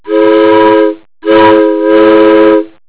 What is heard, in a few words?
WHISTLE